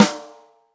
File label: ROCK SNARE CHROMATIC, Real Drum Kits